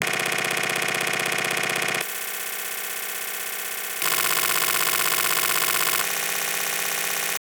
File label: Glitch, Chatter, Metallic, Thin, 4 Tone, Even; Airborne Sound; Manic Glitches